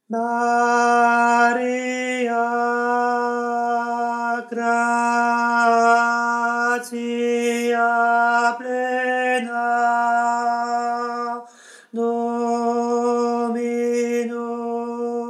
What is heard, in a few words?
tenor; ave maria oriental